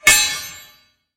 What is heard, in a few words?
impale; cstrike; war3source